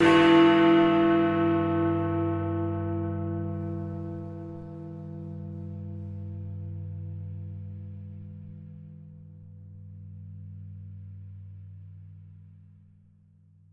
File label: Bell Single; criminality